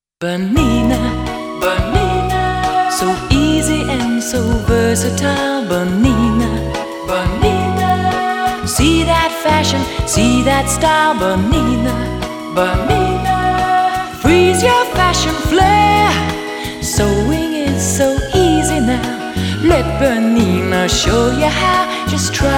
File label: full sung; BERNINA